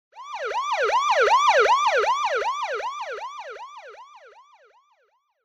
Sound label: american police car